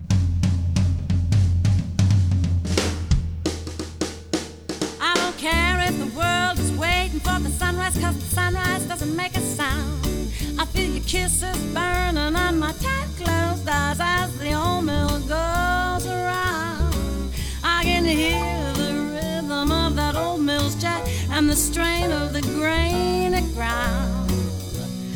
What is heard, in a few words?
swing stretch